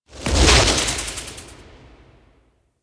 Jump Attack Start; Enemies; Warframe; Infested; ZealoidPrelate

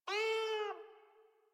Kitten Meow Agitated; Enemies; Warframe; Catbrow

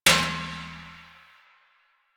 Metal Trash Can Impact; Warframe; Ambience; Nineteen Ninety Nine

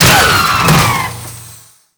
Necramech Ranged Hit React; Enemies; Warframe

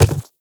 Quadruped Medium Walk Default F, Footsteps; Warframe; Infested

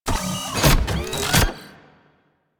Rocket Open; Warframe; Venus; Venus Turrets; Venus Auto Agent Rocket Turret

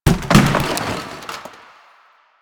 Janitor Cart Impact; Warframe; Ambience; Nineteen Ninety Nine

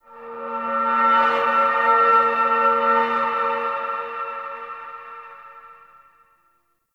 Bell Texture, Crypt Ambience; One Offs; Champion Crypt

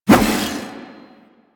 Staff Swing; Warframe; Duviri; Dax; weapons

